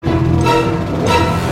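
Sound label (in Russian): опасность приближается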